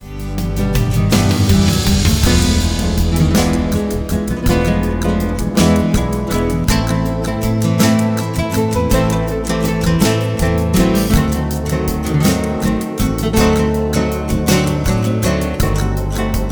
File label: Vocals And Lead Guitar Included Key Of G